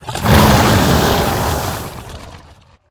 Marshspawn Play

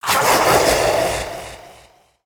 Echoing Elemental Play

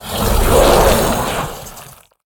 Servant Of Yogg Saron Play

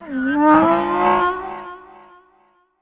Armagedillo Attack

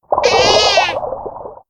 Otter Death

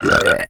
Toad

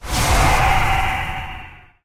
Arcane Magic Attack Underlay

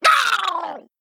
Male Murloc Death

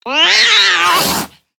Attack

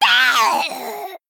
Female Goblin Death

